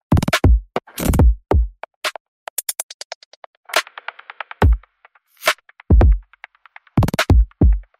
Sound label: drum; signal